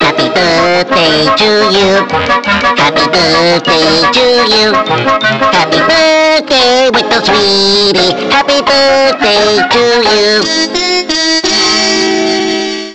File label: SATB; mickey